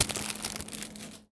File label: Foley